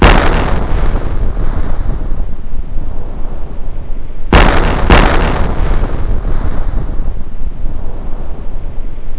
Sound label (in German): donner